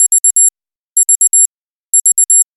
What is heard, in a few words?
alarms; HTC; One-M7